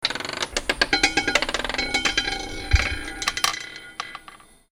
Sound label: ball on number, roulette; Roulette Royal